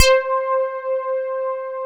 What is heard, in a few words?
SYN, S CLAVI